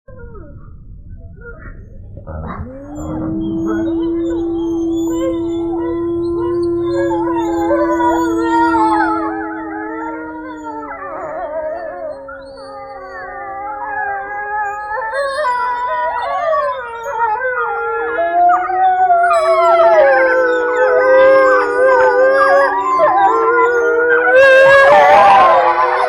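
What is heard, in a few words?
grey wolf Canis lupus, nature sounds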